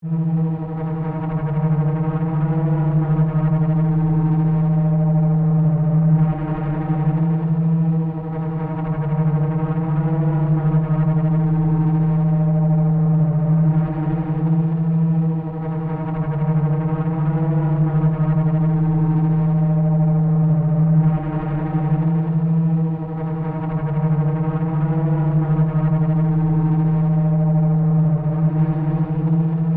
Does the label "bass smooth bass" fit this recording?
No